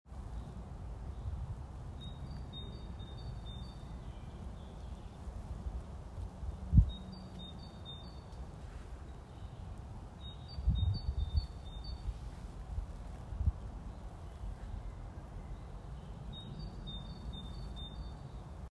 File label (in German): Kohlmeise